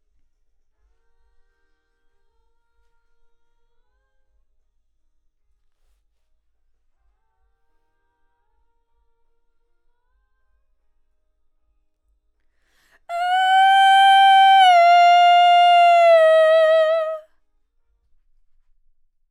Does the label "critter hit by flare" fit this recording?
No